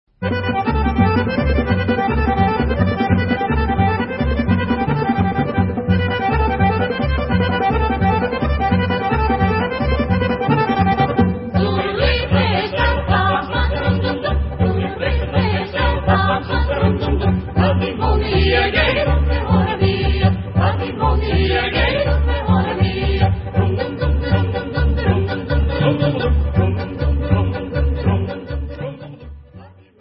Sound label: Folk Instrumental